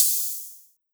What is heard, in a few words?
Drop Ride 128bpm Dry